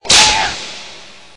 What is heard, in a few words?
minion squeak